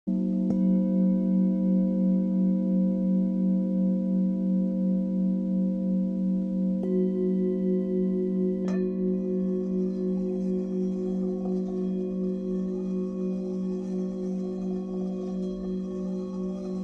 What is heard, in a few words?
Himalayan Singing Bowls